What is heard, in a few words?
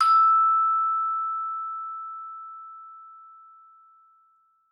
Vibes hard E5, Vibraphone, Hard Mallets; Struck Idiophones